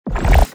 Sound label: atk water gun cast